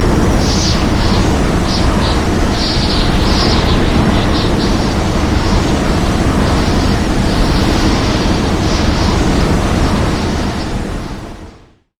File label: yasuo base quickdraw wind; LoL